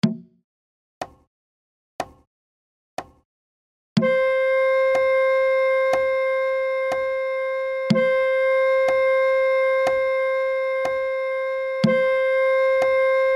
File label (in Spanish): Ejercicio Rítmico redonda